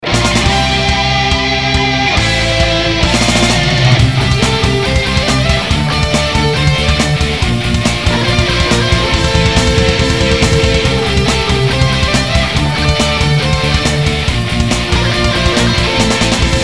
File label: emerald hill metal; sonic the hedgehog 2